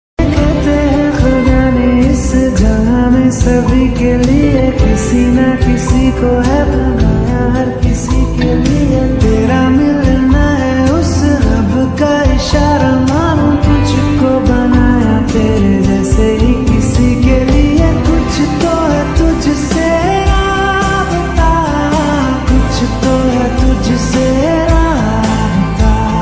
male version hindi ringtone

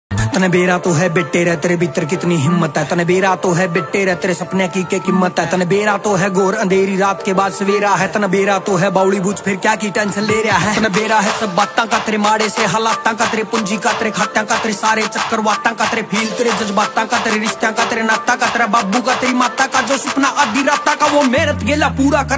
haryanvi ringtone

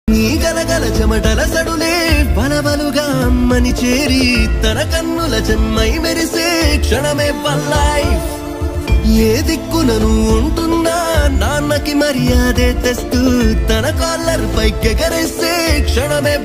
telugu ringtone

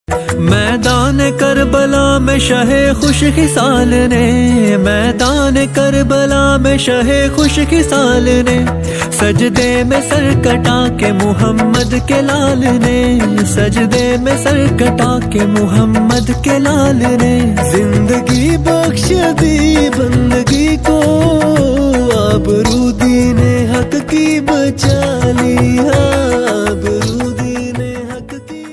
islamic ringtone

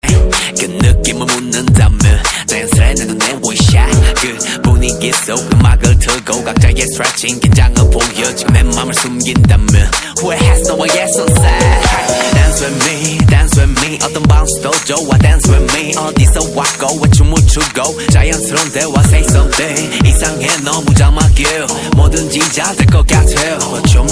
korean ringtone

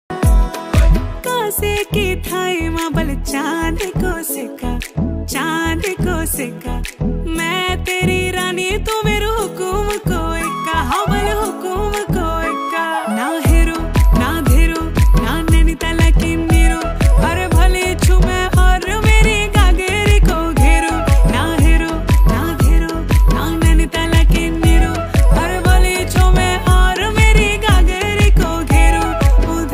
uttrakhandi ringtone

